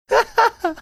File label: cute laugh